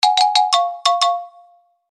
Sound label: funny notify